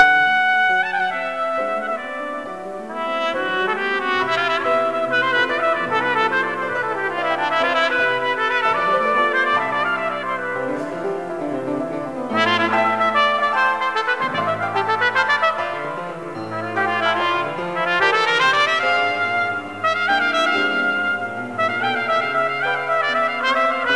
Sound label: saltarello